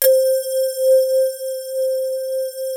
ICE SYNTH C3; Greytsounds VOL-1; VFX

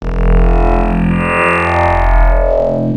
Roboto, wavetables; Waves Codex

